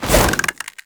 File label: Infested Jetpack Jump Woosh; Warframe